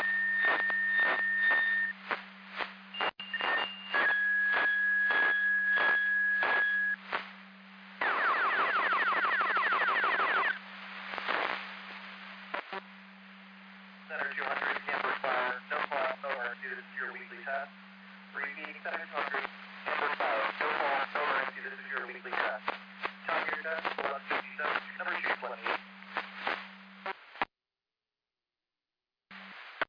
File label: Siren; Hamburg FD